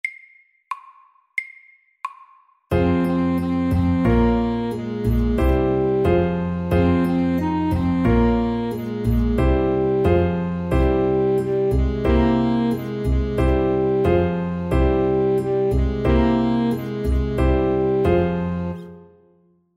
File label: MEDIUM 90